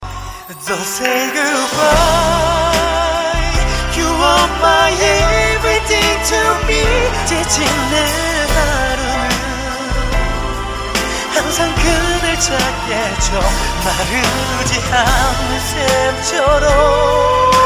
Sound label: Chorus